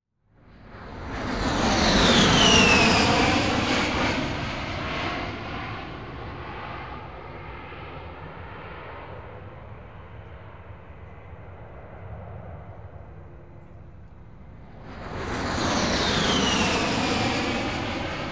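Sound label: Warfare, Usaf Fairchild A10 Thunderbolt; BBC Sound Effects Library; Explosions Guns Alarms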